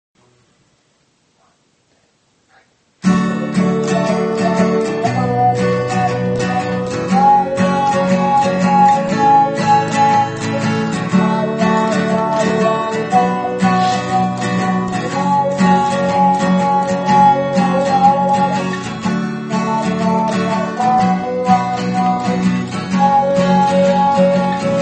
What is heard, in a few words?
reverb; larry bird